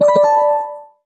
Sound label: Notifier Greeter, BlackBerry 9000 Bold